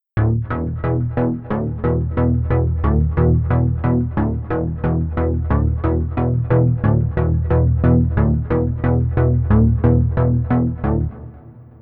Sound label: perfect 5th seq